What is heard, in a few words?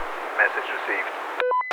Message Received